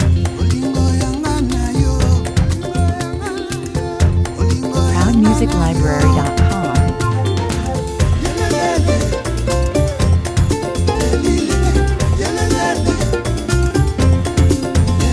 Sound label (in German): Kurzes Intro oder Opener – afrikanische Lebensfreude pur. Dieser positive, optimistische Trailer, 120 bpm